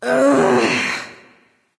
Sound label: "ugh"; smite; voicepack; nike